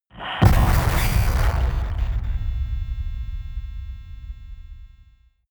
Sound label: scifi forceful, whooshes